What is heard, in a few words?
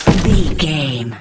sci-fi weapon deep blaster shot